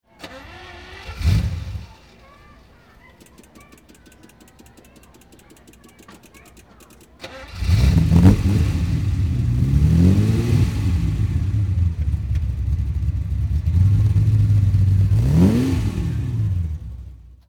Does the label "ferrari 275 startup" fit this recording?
Yes